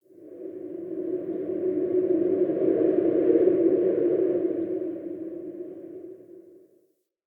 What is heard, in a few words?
wind howl; Ambience; Under City